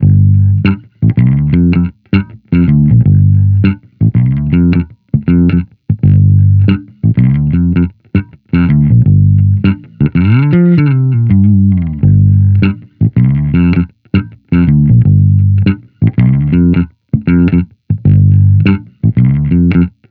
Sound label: Amped loop 80 Gm, Electric Bass Loops; Slate Digital BASSHOLE Sample Pack